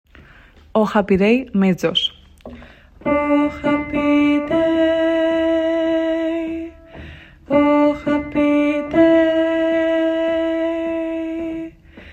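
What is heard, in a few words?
mezzo-soprano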